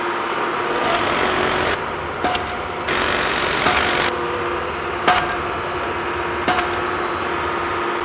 Construction Sound

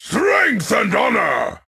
Orc Taunt

